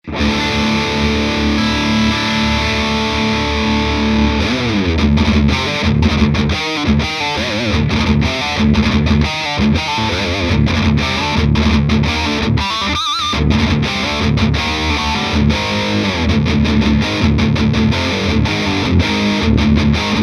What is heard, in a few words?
reaper hp dual recto